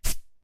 flower hit, monsters

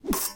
scythe hit

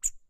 fluffy miss, monsters

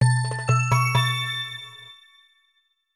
quest done

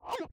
skeleton miss, monsters

